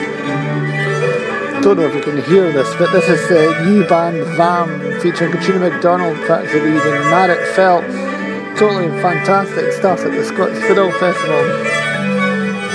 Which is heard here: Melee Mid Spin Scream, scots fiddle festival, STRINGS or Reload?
scots fiddle festival